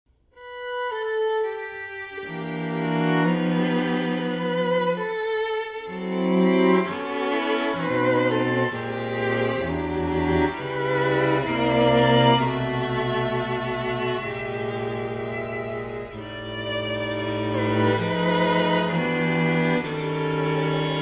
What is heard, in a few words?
Auld Lang Syne